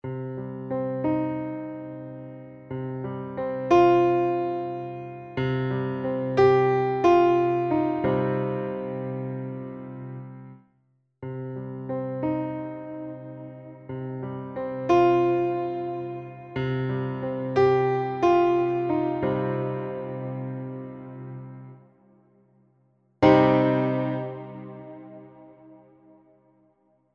minigrand mit dverb

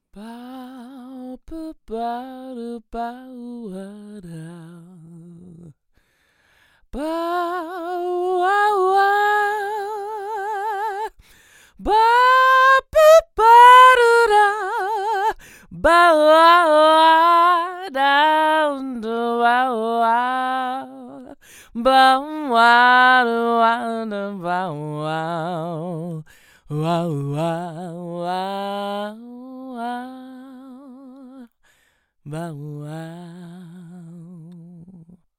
Vocal im Studio

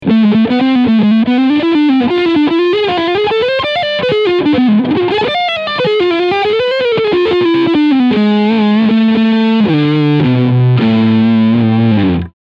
Leadsound Mitte Steg PUs

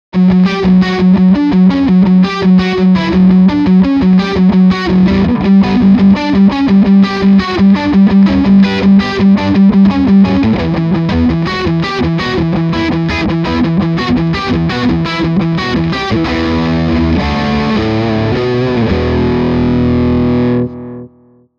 Riff Steg PU

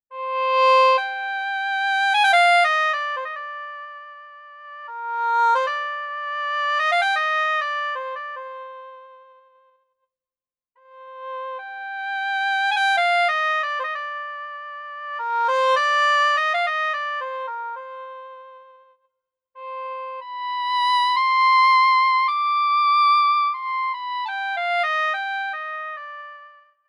Yamaha YDS 150 Sopran Saxophon

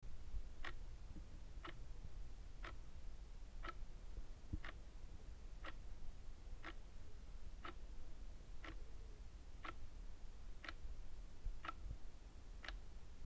Uhr

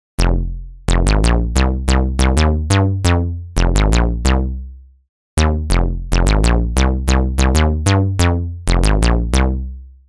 Resonanz Bass E

